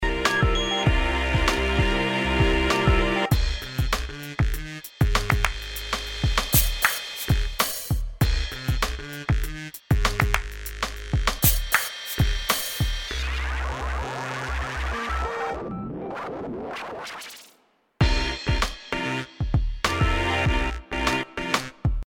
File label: feedback arrange